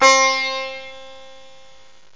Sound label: sitar, indian